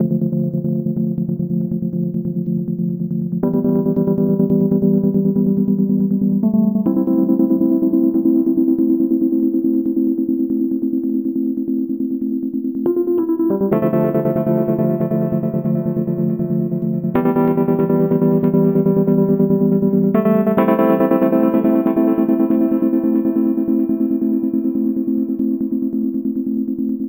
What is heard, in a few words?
140 A Gated Atmosphere